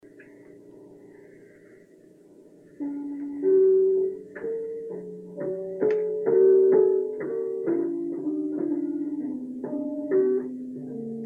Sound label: piano, noisegate